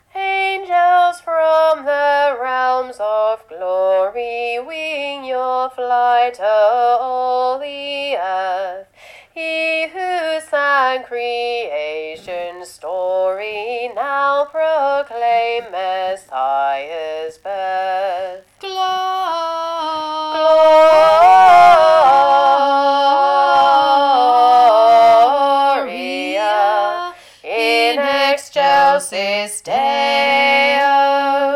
angels tenor bass

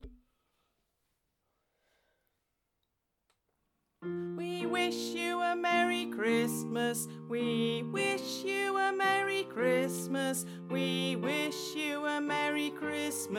Alto, Choir; We Wish You; Christmas

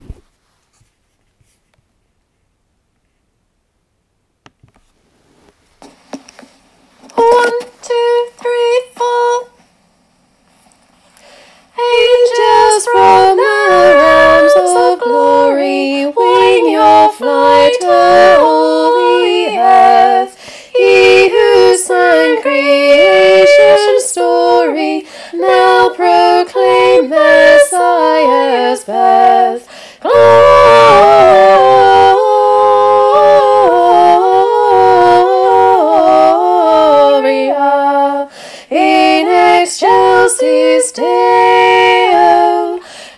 angels sop alto, Christmas Choirs

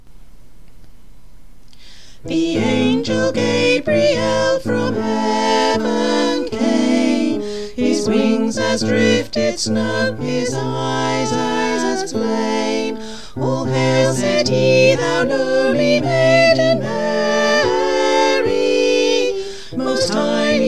SATB, choir; Angel Gabriel; Choir Christmas 2021

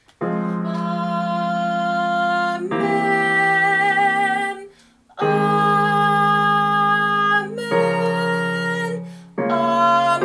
Armistice Amen - Soprano, Choir

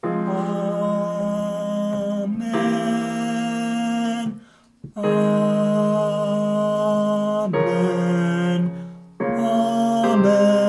Tenor

